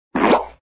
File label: popout; factory balls